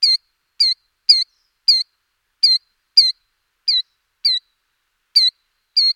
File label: Bird Call